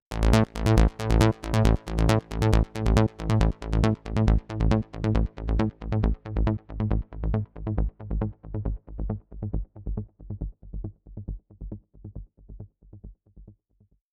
137BPM Bassline Outro, Amajor; Unlooped

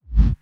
BD Gate FX, Bassdrums; Vengeance Samples

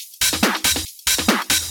Loop 140 BPM; vengeance essential club sounds-3

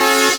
Synth Sound; Vengeance Samples; VEE Synth Shots

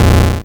Arcade Riffs

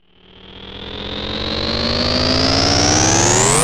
Sweeps Medium

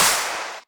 Clap; Vengeance Samples; VEC1 Claps

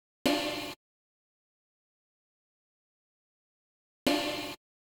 126BPM Cutted, tech house; Connectors; Vengeance Samples; Bonus TechHouse Kits

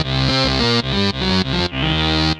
Arp C Line, ARP Odyssey; musicradar-synth-samples

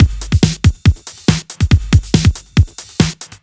Breakbeat 140 BPM

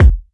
Bassdrum; Vengeance Samples; VEE Bassdrums

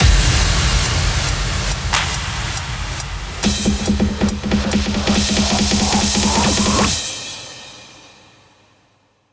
140BPM 4Bars, Hands Up Trance Fills; Assumptions; Vengeance Samples

